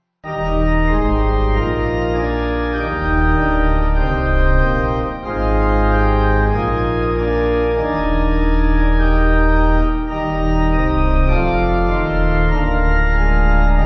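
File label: Pipe organ, church music backing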